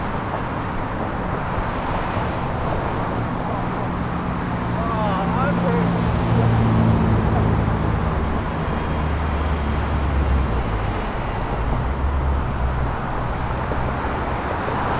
University Ave Background Noise

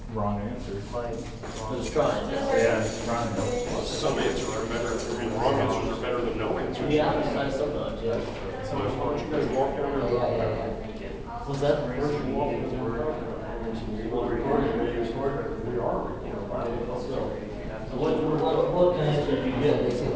Stairwell Background Noise

